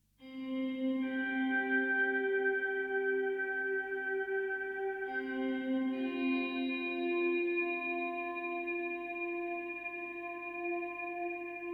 Cave - Rustling Leaves; Opus: Echo of Starsong

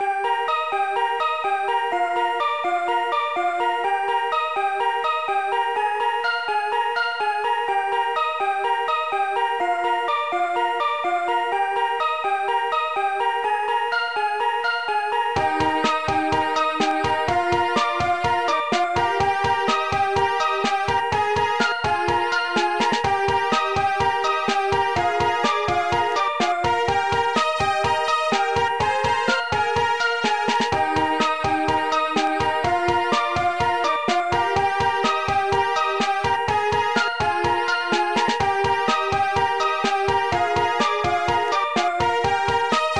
Level BGM; Titeuf Ze Gag Machine; GBA

